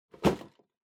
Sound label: Drawing Box Catch